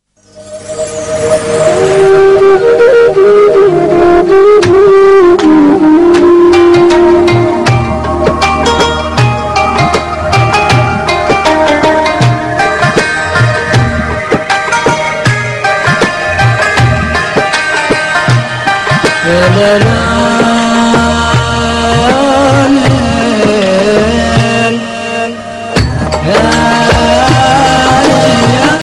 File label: ramadan ringtone maula ya salli wa sallim instrumental bgm ringtone